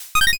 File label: line clear